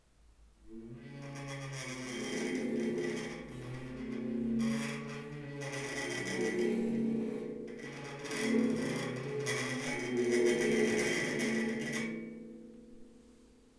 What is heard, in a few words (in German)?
Rubber Mallet groß Notenständer